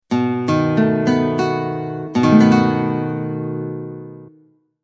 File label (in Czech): Akord H7